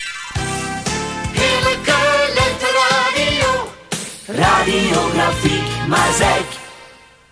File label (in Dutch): Jingle lente; Seizoenen